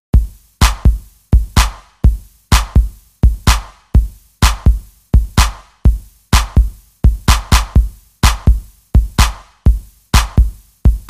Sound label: break track bpm 126